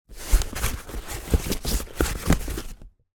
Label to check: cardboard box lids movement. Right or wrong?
Right